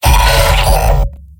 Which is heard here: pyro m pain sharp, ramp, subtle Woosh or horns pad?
pyro m pain sharp